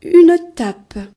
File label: clap; gcompris-data